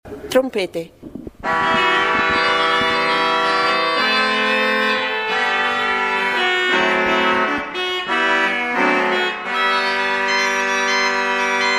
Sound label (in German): Trompete, Pfeifen; Toene